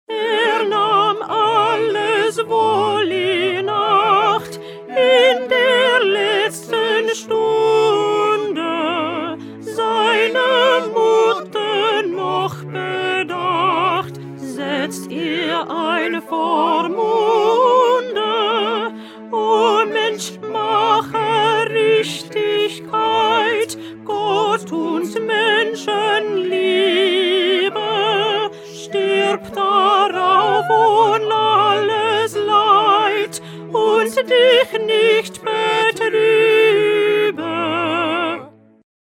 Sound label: Alto